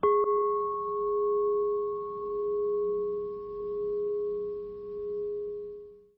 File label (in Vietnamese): Âm thanh may mắn; tiktok